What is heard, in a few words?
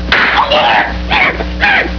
Slap; Three Stooges